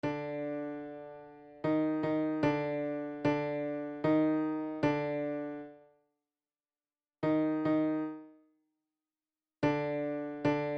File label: minor 3